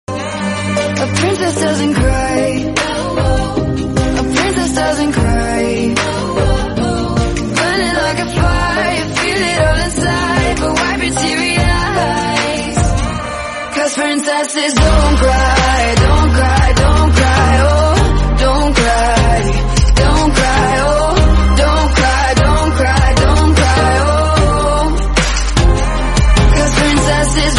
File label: english song